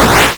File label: Arcade Riffs